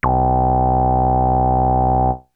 Bass; ZERO G - Datafile DISC 2

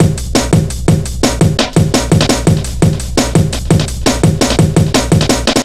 170, Drum Loops, Drum & Bass

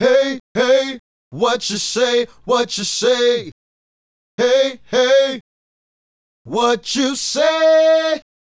Male Group, Hip-Hop and RnB Vocals

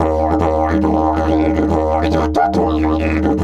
140, DIDGE RIFFS; Big Fish Audio - Didgeridoo and Other Primitive Instruments

